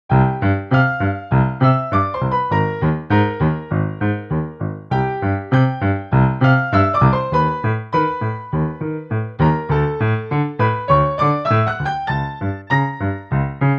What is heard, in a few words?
no metronome